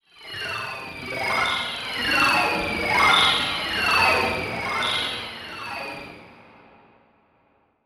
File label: Production Element Title Transition Data Transmission Communication